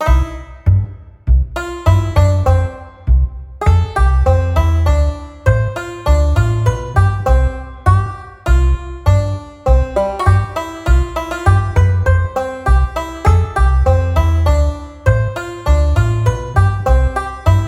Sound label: Heartwarming Banjo Light Country Music